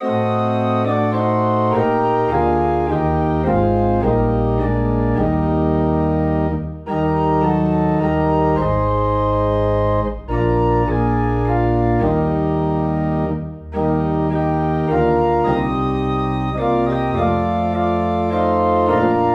Organ in E Flat

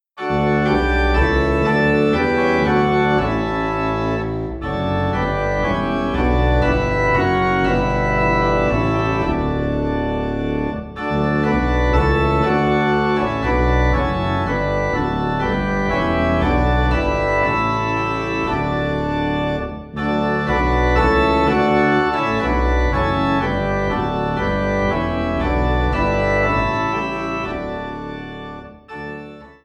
Organ Low in D; Praise To the Lord The Almighty

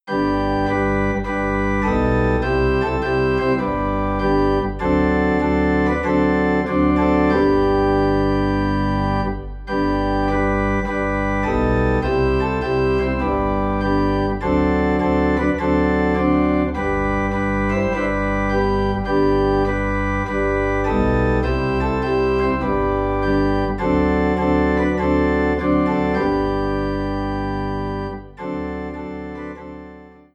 Organ in G; Tell It to Jesus

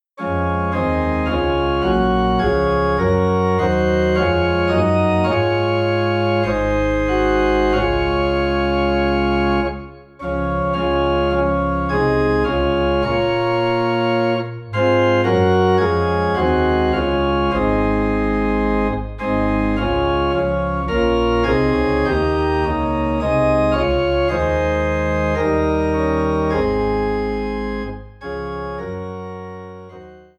Organ in D Flat; Rejoice the Lord is King

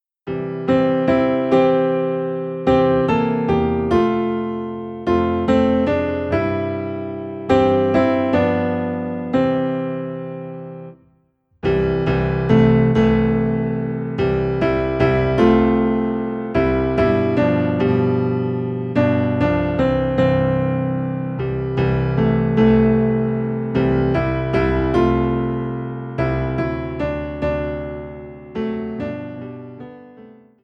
Piano Low in C; He Lifted Me

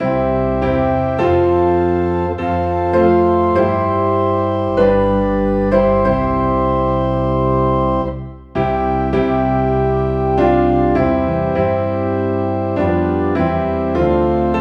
Piano and Organ in C; It Is Well With My Soul

